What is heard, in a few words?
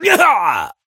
pain death